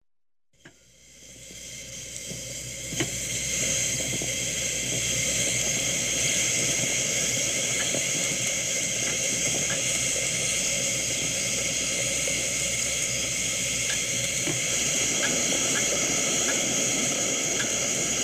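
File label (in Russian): лаборатория горение манипуляции с горелкой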